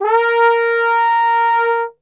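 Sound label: A#2 trombone gliss, Brass; Best Service; Brass Super Section; TRMB GLISS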